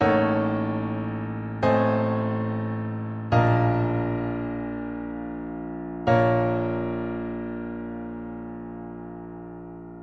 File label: piano; garrysmod; decay